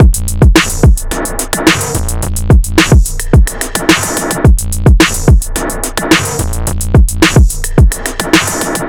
Drum Loop 108 BPM, Experimental; Cleansed; Cymatics; GHOST (Beta Pack)

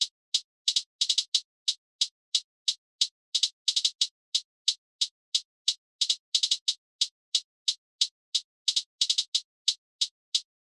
Drum Loop 90 BPM Hihat; Loop Stems; Cymatics; Vibes II Drum Loop Collection; Sail

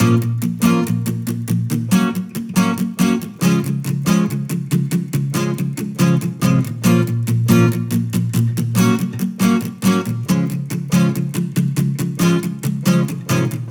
Acoustic Guitar Loop 140BPM A#min; Leads; Ghosthack

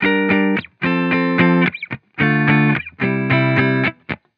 Vibrations Pop Guitar Loop 110 BPM F Maj; Cymatics; Vibrations Guitar Sample Pack

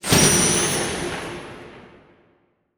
UI Lightning Confirm; User Interface

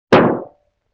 Bass Drum Rim Shot; Vintage Cartoon; Drums & Percussion

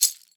Double Rattan, World Percussion; Cymatics; PHARAOH Beta Pack; Pharaoh Premium Drum Samples (BETA)

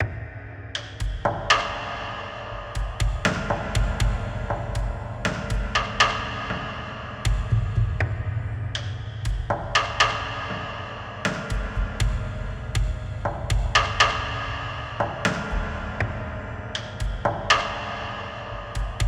Percussion - Fm 60Bpm; Construction Kits; STEMS; Ghosthack; SkyRealm; Kit 04 - High Rock View

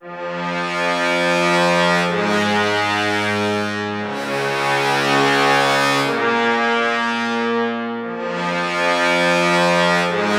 Trombones - Fm 120Bpm; Construction Kits; STEMS; Ghosthack; SkyRealm; Kit 25 - Vvardenfell

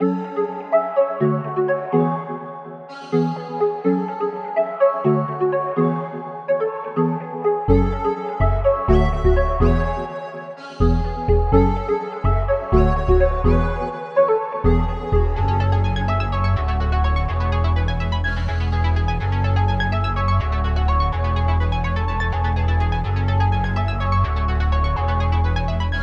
125 BPM F# Min, Melodies, Virtual; Internet Friends; Cymatics; Destiny Premium Melodies (BETA)

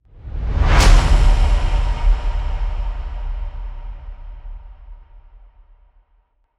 Impact; Hits

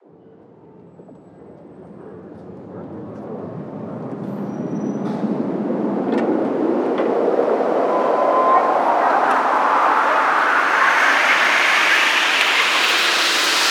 Riser City; One-Shots; SFX; Ghosthack; Color Bass Essentials

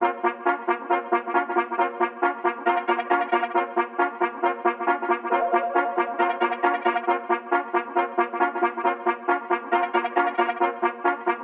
136 BPM A# Min, Melody; Duck March; Cymatics; 2022 Melody USB Expansion

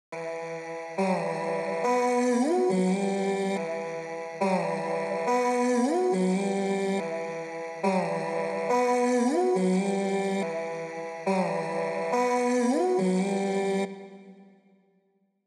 140 BPM E Min Vocals; Cymatics; 2022 Melody Collection; Drill; Loaded

